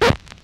Stylus Noise, Vinyl Noise; noise hiss crackle

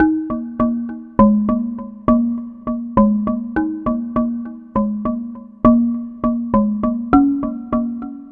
Marimba Low; Ambient; Melodic; Ambient-Melodics

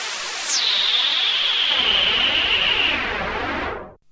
Juno-60, Filtered Noise

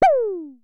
Synare Filter; Star Instruments Synare 3

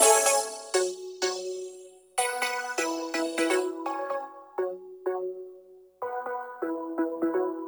Synth Pattern; Electrocution

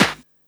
CLAPS HIP, CLP; 90 s Sample CDs; Best Service Dance Mega Drums

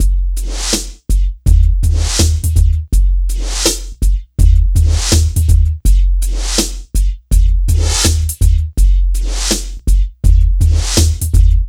DRM, Hip Hop and R&B Drumloops

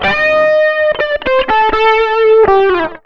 SOLO R, Electric & Acoustic Guitar Loops; USB Soundscan vol.04; AKAI; 120 SOLOS

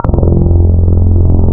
FAIRLIGHT MOVE, wavetables, Classic Synths

